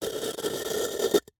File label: Jar, terracotta, lid, rubbing, scraping, grating, rough, ragged, short; Eiravaein Works; Vaeyan IV